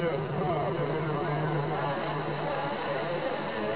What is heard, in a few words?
black echo